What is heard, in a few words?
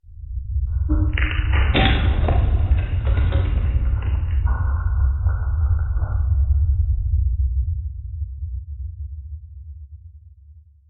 Wood Colliding Simulated Tall Ship Crashing